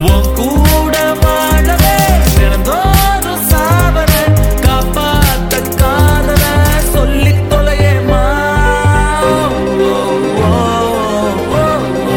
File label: tamil tune